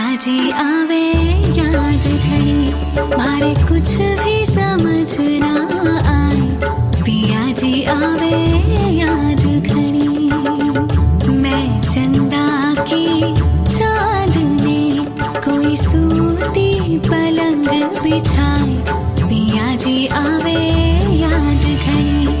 hindi ringtone, bollywood ringtones

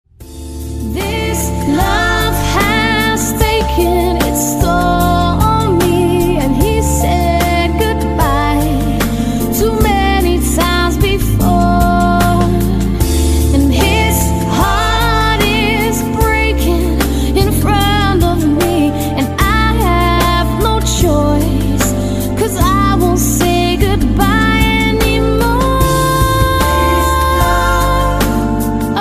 lounge jazz ringtone

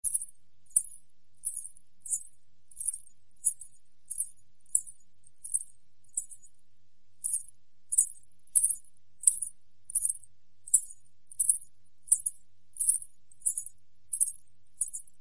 anklet sound effect ringtone; samsung

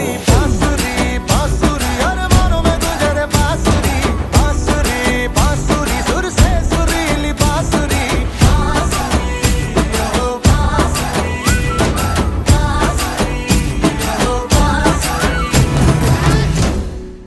bansuri ringtone, hindi; bollywood ringtones

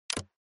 mobile phone screen lock tone

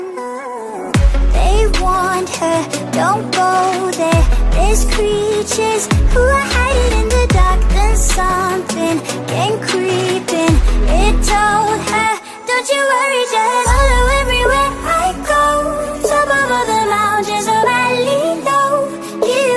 ringtone, english ringtones

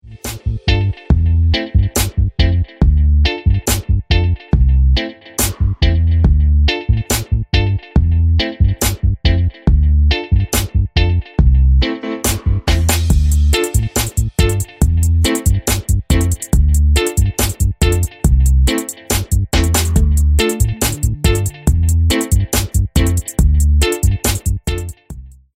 ting ting ringtone

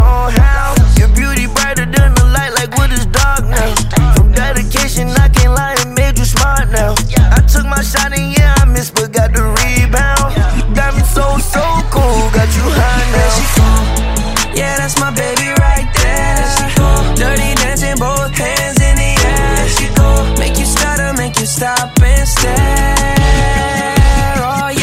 english ringtone

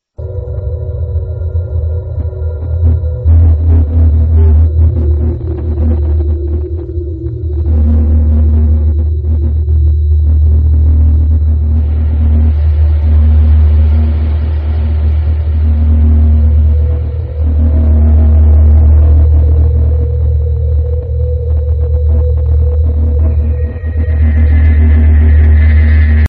horror sound effect ringtone

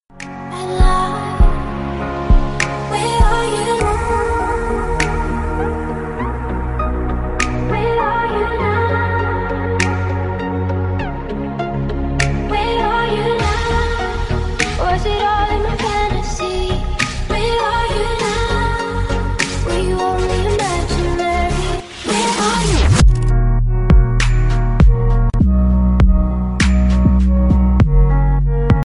famous english song tone ringtone

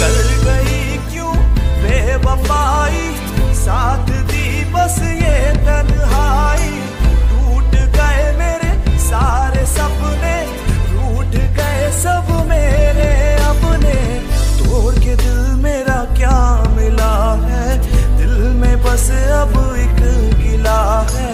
remix ringtone; english ringtones

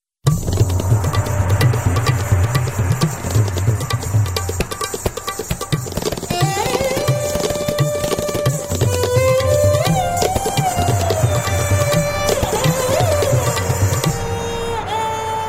tabla jazz ringtone

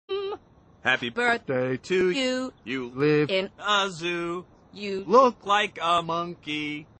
happy birthday ringtone

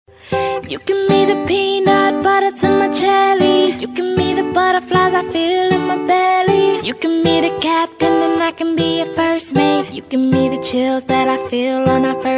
romantic lovely ringtone

